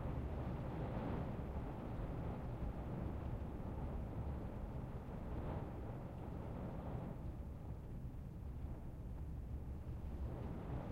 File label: Rain Street; MiSide